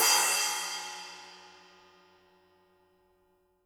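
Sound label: Cymbal, Real Drum Kit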